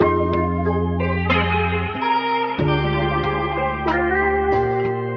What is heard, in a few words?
guitar, Phonky Loops